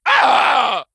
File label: pain zombie; cstrike